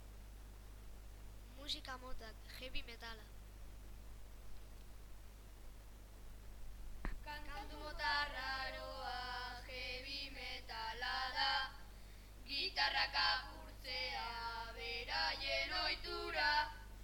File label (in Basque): jazz musika